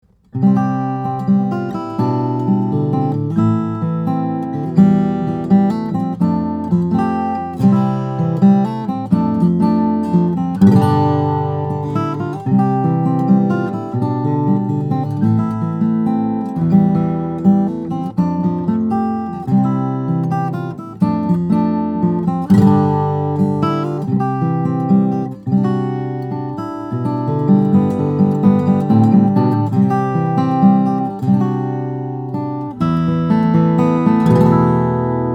Grand Concert, guitars, steel-string guitars, flattop; charis acoustic; east indian rosewood cedar